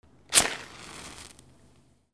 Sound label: FIRE